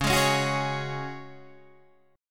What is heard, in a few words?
C sharp Suspended 4th C sharp down Guitar DADGAD